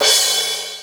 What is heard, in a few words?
crash